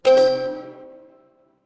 feedback achievement

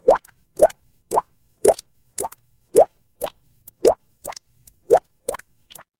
water drip

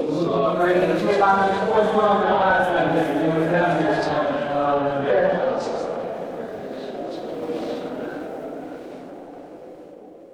crowd murmur

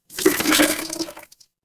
water pour

